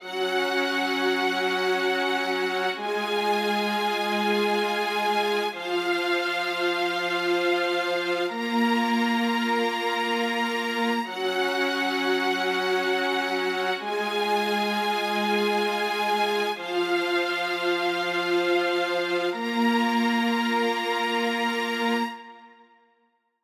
string sectio loop, 87 bpm

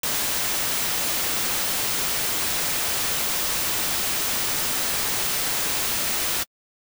Saw Wave, 87 bpm

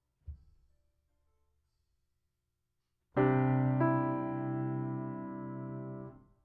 Piano Ribbon

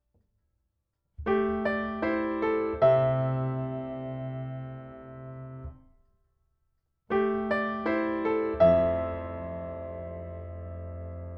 Piano Ribbon; Piano 2

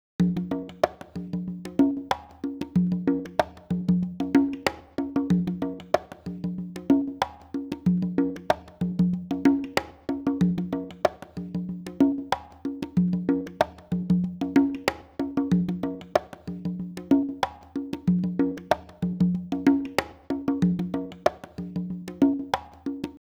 congas

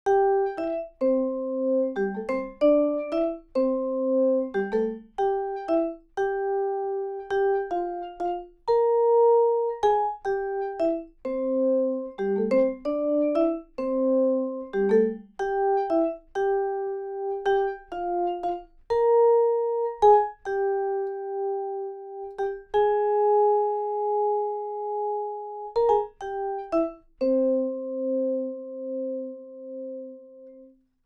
Vibes

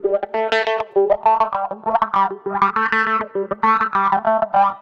Guitar 140, 87 bpm

